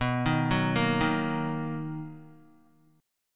B Major 7th B down Guitar; arpeggiated; JGuitar

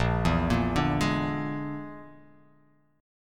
A sharp 7th Flat 5th A sharp down; A#7b5 arpeggiated; JGuitar

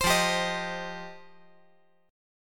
F 7th Suspended 2nd F down

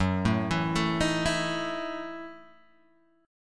Gb Minor 13th Gb down Guitar Open G

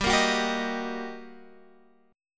G Minor Major 7th Sharp 5th; strummed; x,10,8,11,8,11

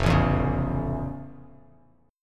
F Augmented Major 7th, F+M7 chord, Bass Standard; strummed; 1,0,2,6; JGuitar

